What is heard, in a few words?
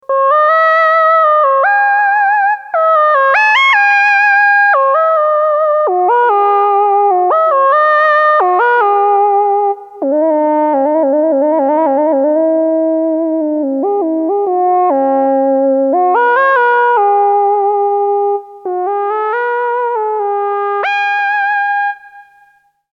Micromoog Solo